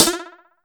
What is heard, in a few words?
HH CLOSED, Disco House; USB Soundscan vol.20; Fresh Disco House I; AKAI